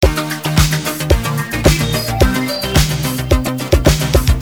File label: Loop